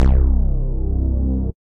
Human Bass, Operator; Instruments; Ableton; Factory Packs; Synth Essentials

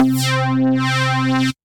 Modern Sweep, Synth Lead; Ableton; Factory Packs; Build and Drop

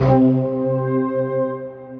Plainsland Flutes; Ableton; Retro Synths; P-50 Linear; P-50 Structures

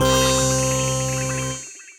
Clav; Who Capsule Clav; Ableton; Retro Synths; Waveframe; Waveframe Keys

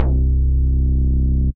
Wavetable Bass; Modison

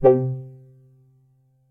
Twenty Perc Rev, Synth Keys

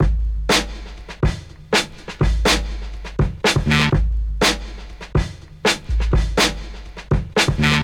Drums, breakbeats; Uprockable; Ableton; Breakbeats by KutMasta Kurt

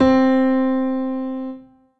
Grand Piano Stretch Solo; Ableton; Factory Packs

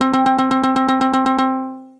String-Ting Arpeggio Bass; Synth Rhythmic

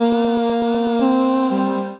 Odd Loops, Complex Vocal Racks (Root G); Voice Box; Harmonic Vocal Racks

